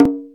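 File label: Roland R-8 open h conga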